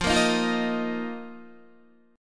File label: F 7th Suspended 4th F down Guitar; strummed